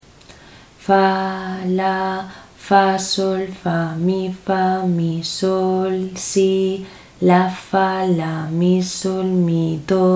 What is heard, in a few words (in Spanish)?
ritmo 6-4; Mixto